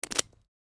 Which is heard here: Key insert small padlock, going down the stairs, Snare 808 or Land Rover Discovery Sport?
Key insert small padlock